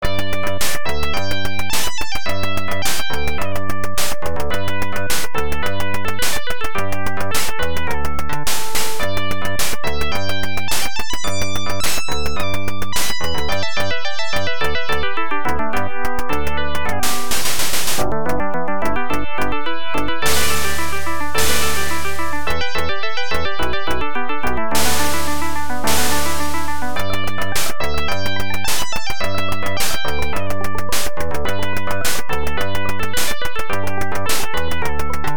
Round BGM; Shanghai 2; arcade

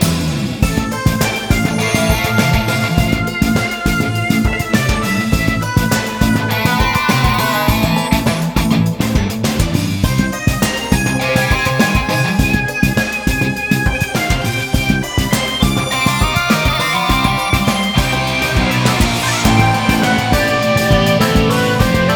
Nest Dungeon labo battle end; vivid knight switch windows gamerip 2021

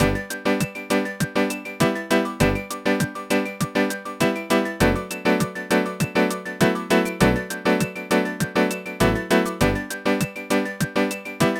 Pure water; puchicon; dsi ds

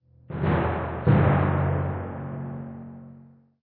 Tymp Hits

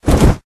Body Thud soft

